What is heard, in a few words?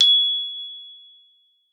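GLOP G#5, glockenspiel; Xsample VOL-4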